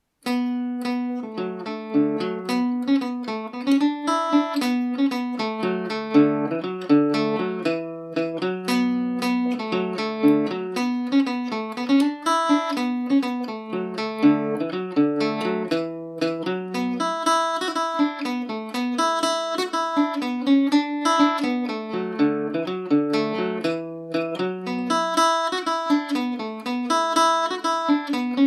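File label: E dorian, irish mandolin; Pat Horgan’s Number 2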